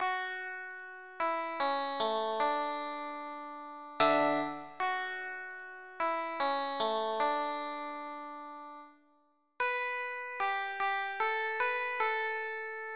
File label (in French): guitare